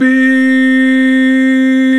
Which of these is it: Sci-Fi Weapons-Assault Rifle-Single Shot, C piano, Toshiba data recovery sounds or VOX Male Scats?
VOX Male Scats